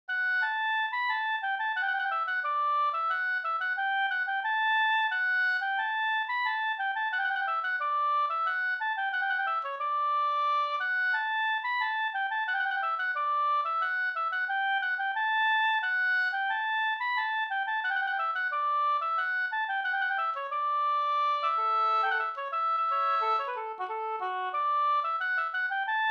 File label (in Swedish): ganglat skanklat; fr Bjursas